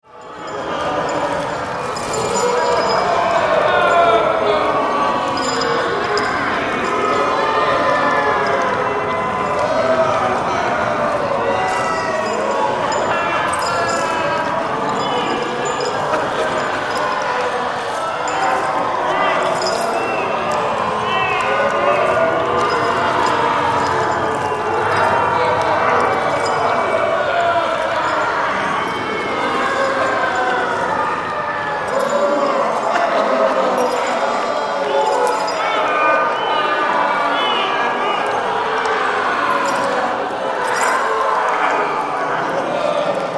Dungeon Chains, Dungeon, Chains, Wind, Water, Drips, Horror; Screams